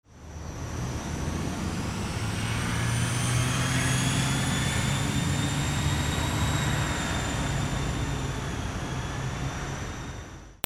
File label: VEHICLES